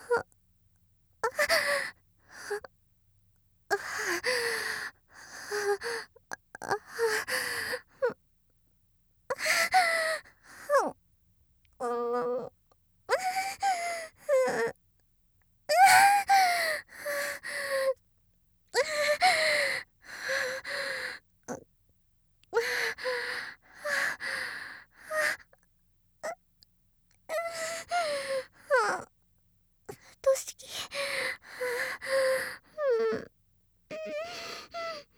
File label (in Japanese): 喘ぎループ